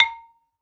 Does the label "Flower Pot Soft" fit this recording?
Yes